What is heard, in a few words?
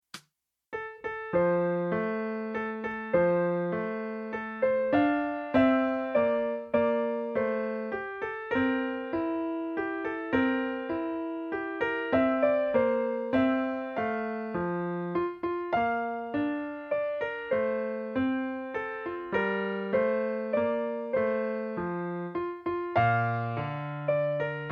Lullaby